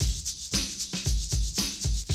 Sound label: Classic Drum Loops